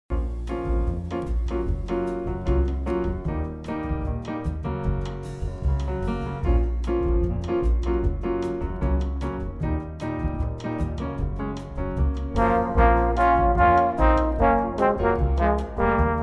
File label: God Rest Ye